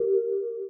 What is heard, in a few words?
close; GD550 Pure; Slide Open-Close